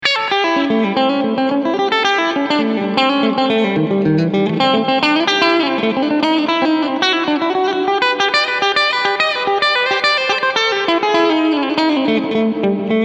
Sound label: AMP Room